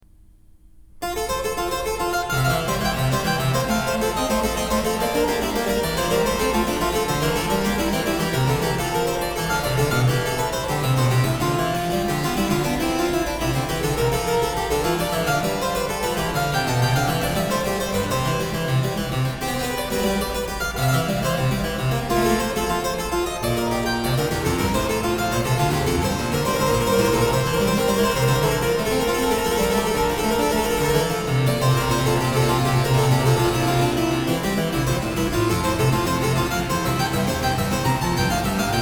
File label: Praeambulum À 2 In G Major; BWV 781